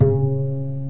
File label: Plucked Bass C sharp 4, Upright Bass Plucks